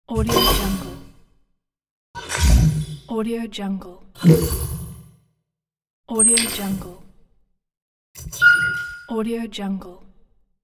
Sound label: dynamic game grab